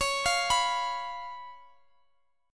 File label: C sharp 7th, C sharp, down, Guitar, Open F; arpeggiated